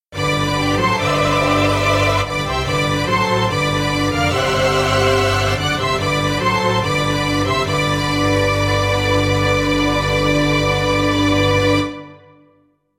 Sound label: fanfare strg orch; the ghost of christmas present